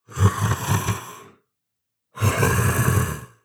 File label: Dragon See